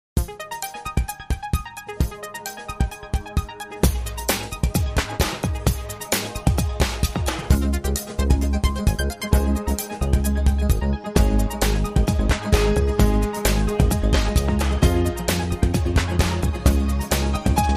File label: Piano On The Beat